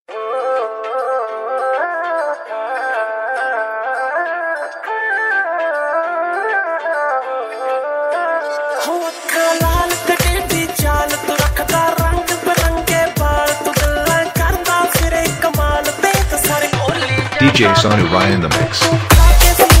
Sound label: Dhol Remix Punjabi Song